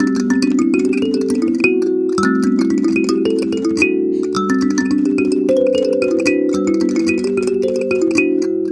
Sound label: Kalimba, African; Sonic Foundry (Sony Creative Software); Continental Drift Aftershocks